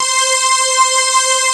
AP SYNTHS; Pro-Rec - Synthsonic; JV ANALOG2

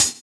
Hhat, Hihat; Equipped Music; Loungin House

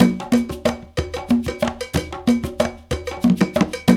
Bembe 6/8, World Dance; Spectrasonics - Supreme Beats - World Dance

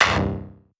note tech chord; Polyend; Recue; melodic

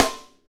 snare, hip-hop rap, Roland; Northstar; Drumscapes Roland

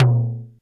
RSF DD Tom, DD-30

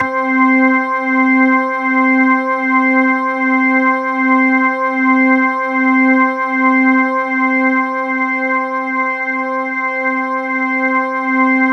C4, synth; 90 s Sample CDs; NiceBeats; SynthLine VOL-28

